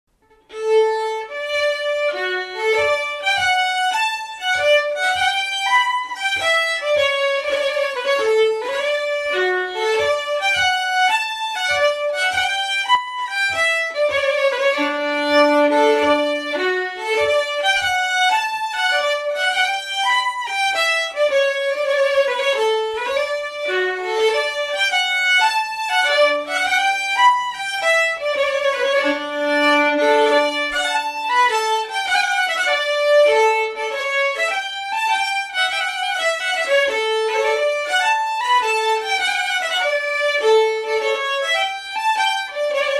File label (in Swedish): Polska hambopolska; efter E.K. Hedbom; sodra dalarna